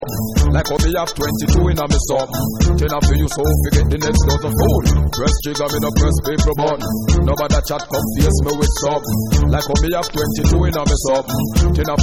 Dancehall Version

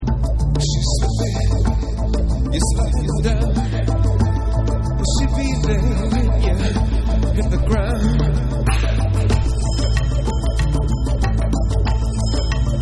Tabla Motown Remix

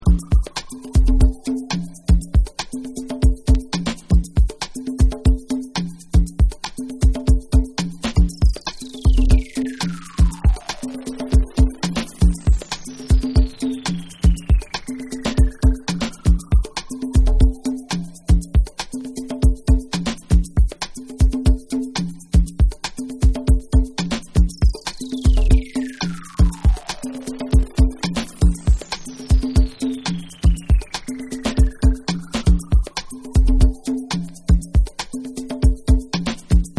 Percussion Groove

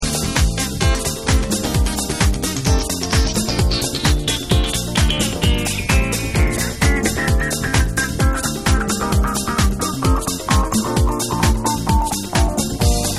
Latin Revival

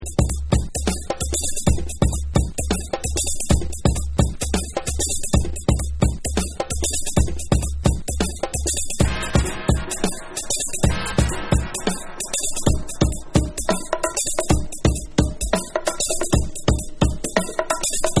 Pots And Pans Mix

